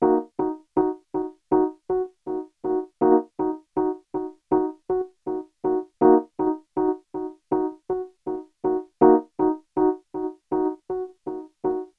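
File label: 080 West Coast Hip Hop Mark II Eb minor, E-Piano; Pro Tools Essential Loops; Digidesign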